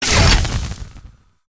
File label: gunstand flamethrower fire stop; gow; turret judgement